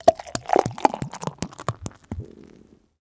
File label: Bottled Drink Pour; eating & drinking